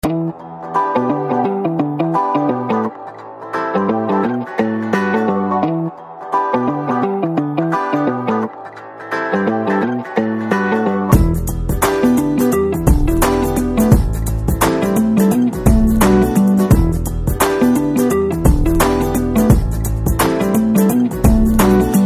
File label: Instrumental Ringtone